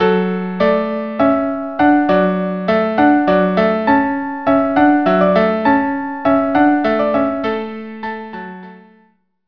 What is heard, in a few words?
Hornpipe duo